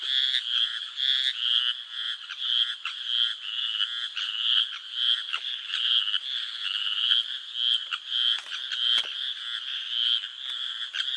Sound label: litoria freycineti; Wallum Rocketfrog